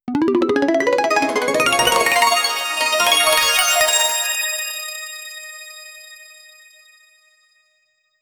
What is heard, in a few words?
special cell merge, arpeggio